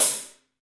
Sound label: HH, Kits, Rev